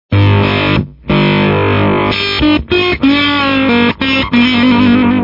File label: ring